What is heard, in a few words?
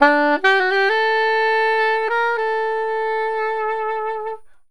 SAX, Balkanish Traditions